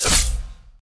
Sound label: bat; autochess